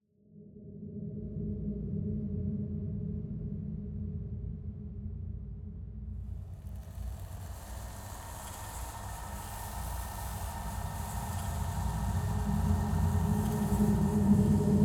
Slow Pulse Drone, Cinematic Drones; Justsoundeffects

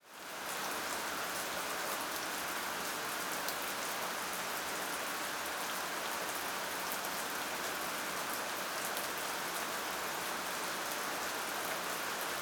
Rain Splatty Heavy; Soundopolis; Natures Fury; Fienup

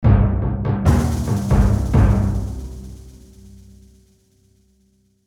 Orchestral Phrase; FXHome

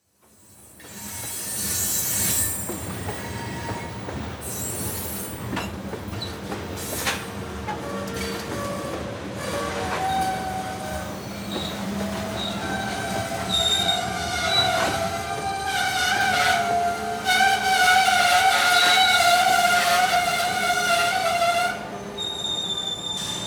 train, Rusty Old Freight Trains Up Close; Kevin Durr; Richmond

